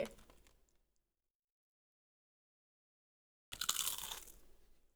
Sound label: eat snack